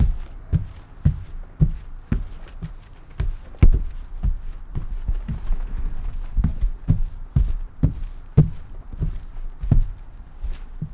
Walking on carpet floor

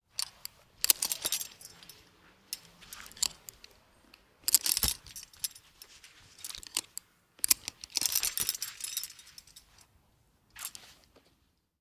Carabiner Attach To Harness

